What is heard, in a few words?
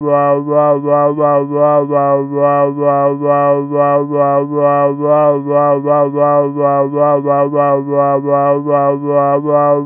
babble